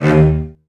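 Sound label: CELLOS F2, Strings; ILIO; Synclavier Strings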